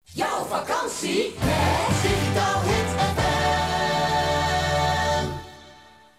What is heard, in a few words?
Jingle accapella; radiopiraten